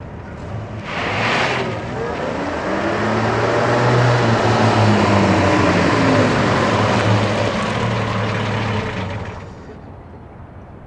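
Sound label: Chevy Truck 46 Stop Off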